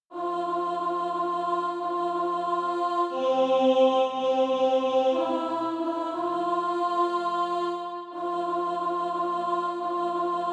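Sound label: alto, SATB Practise Music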